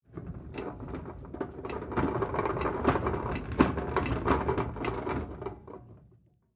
Tool Chest Spin, Industry, Metal, Tool, Chest, Spin, Roll; Hand Tools; BlastWave